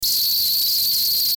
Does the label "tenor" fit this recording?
No